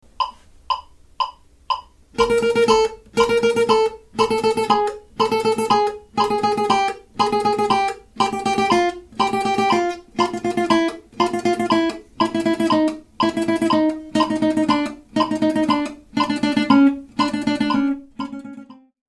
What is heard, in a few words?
speed fast